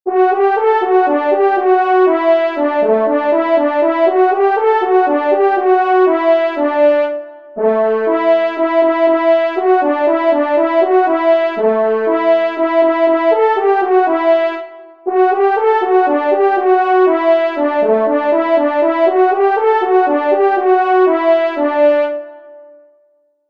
Bonsoir Solo, trompes

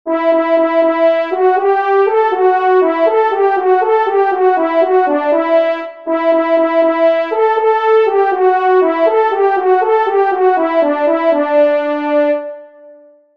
Hallali par terre Solo; Fanfares imposables en Concours

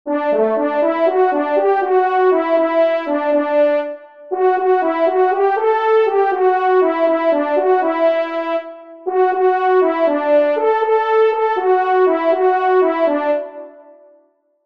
Relance a vue Solo

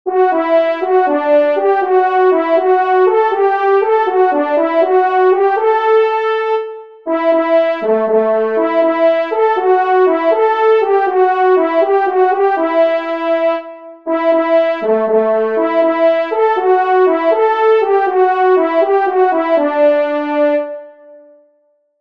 Solo, trompes; Cerf 3 Dauphine

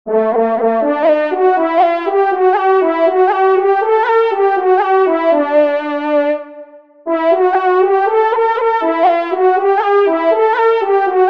Cerf 2 Discrete Solo, trompes

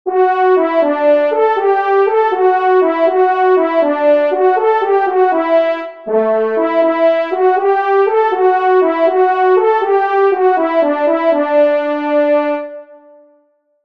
Cerf Daguet Solo, trompes; Fanfares imposables en Concours

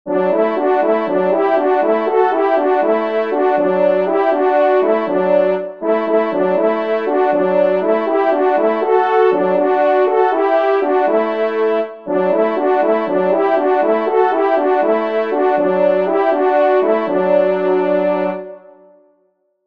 Cerf Tete Bourbon Duo, trompes; Fanfares imposables en Concours

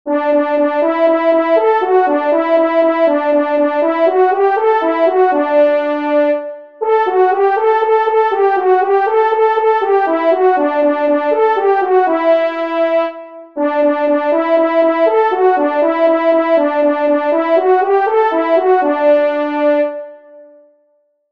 Biche Solo

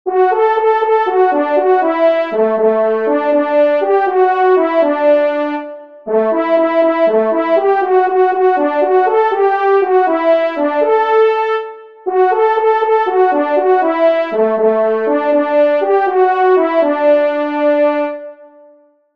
Dix Cors Jeunement Solo, trompes

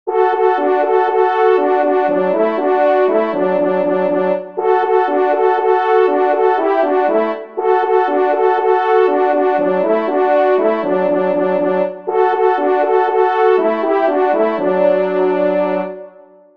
Appel fanfare des maitres Duo; Fanfares imposables en Concours

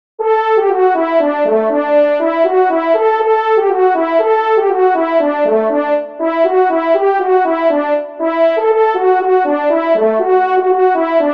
Culbute en foret Solo, trompes; Fanfares imposables en Concours

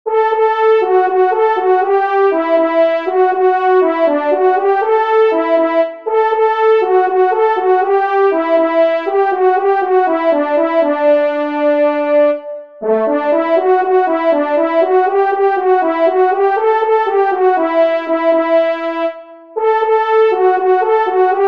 Changement de foret Solo, trompes; Fanfares imposables en Concours

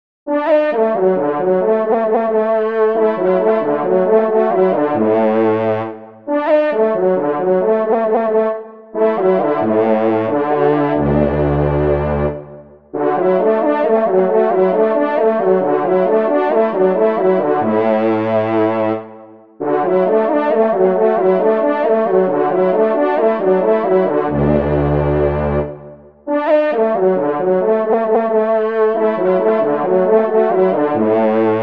Salut aux Sonneurs Basse, trompes

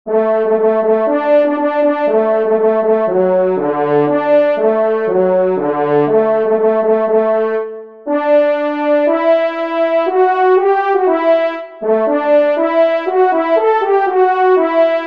Solo, trompes; Point du jour; Fanfares imposables en Concours

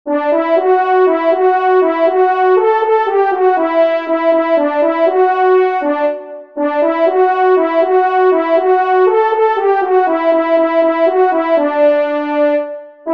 Marche de Venerie Solo; Fanfares imposables en Concours

